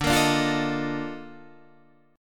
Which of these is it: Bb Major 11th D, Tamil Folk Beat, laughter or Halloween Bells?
Bb Major 11th D